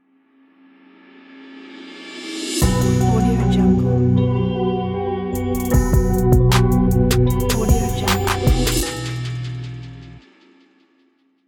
mysterious trap ident

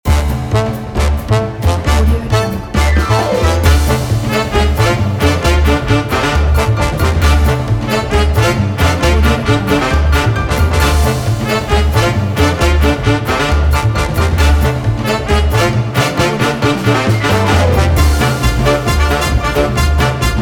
big band swing kit

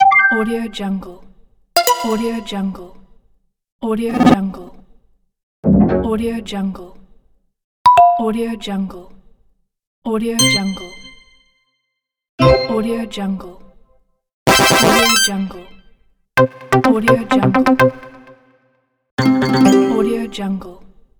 button user interface fx sound

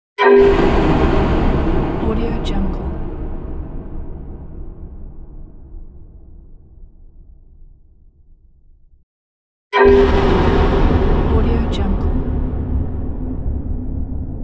horror hit

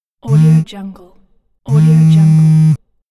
cell phone call vibrate

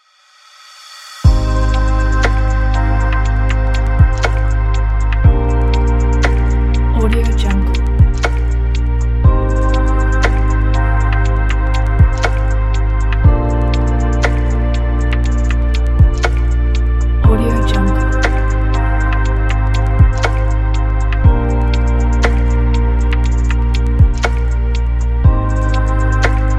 electronic dreamy pack

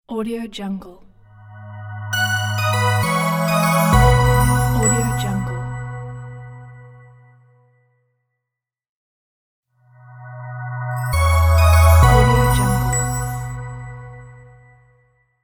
christmas air logo

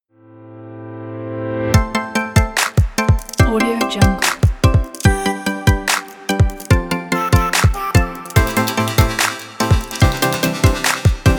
summer future bass

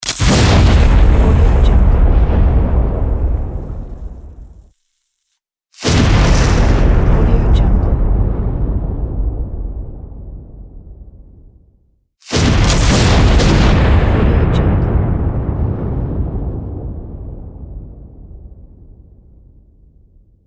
thunder; duplexaudio

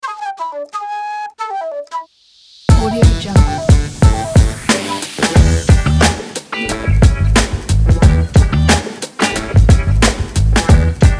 street funk hip hop beat

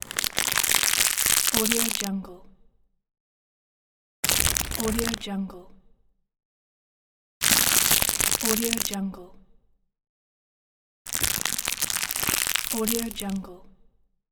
crumple

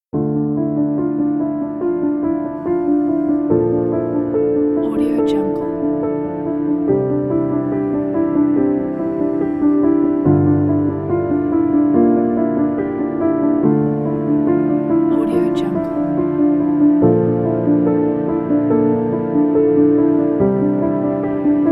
sad pianos

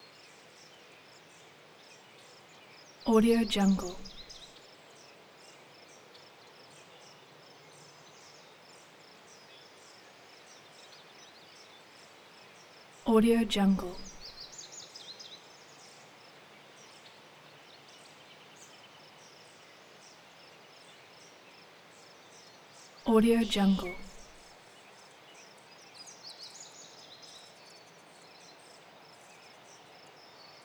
ambience ohio meadow with pine june am

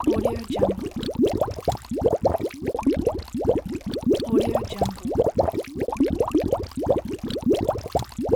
bubbling water; juicycorp

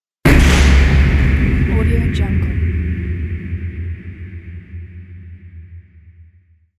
artillery in

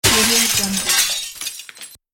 stone breaking window

